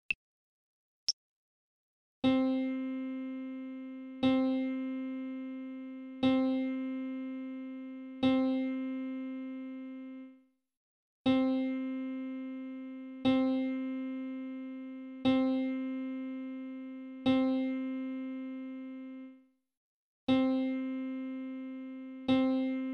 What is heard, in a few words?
c piano